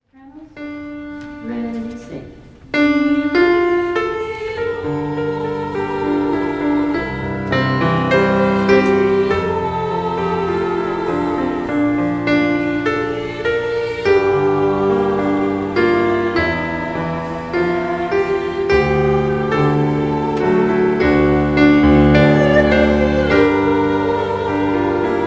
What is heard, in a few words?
soprano refrain